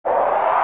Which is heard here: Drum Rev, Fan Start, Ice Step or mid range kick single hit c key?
Fan Start